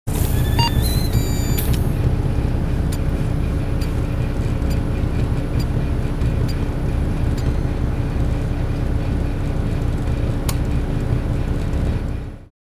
Blood Counter; Machine Hum Heavy, Fast Beeps, Air Chuffs And Clicks